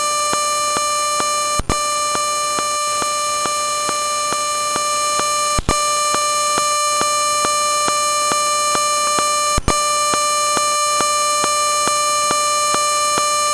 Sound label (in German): rfid kasse; vdb 7 emv-tagung